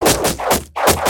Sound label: arrow